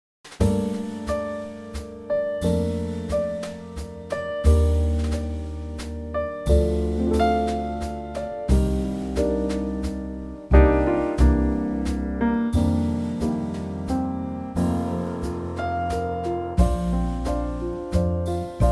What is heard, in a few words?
Bb Trio Ballroom